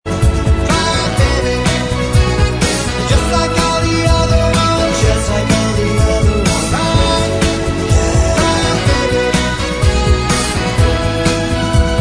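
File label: Bvox Track, backing vocals track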